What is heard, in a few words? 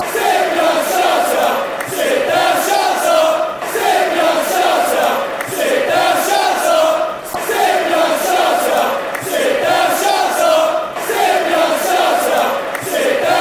chants; sit down shut pfc